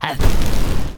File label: China Click Sound; Jolly Battle